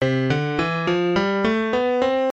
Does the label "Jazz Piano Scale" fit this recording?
Yes